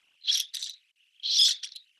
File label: screeches audible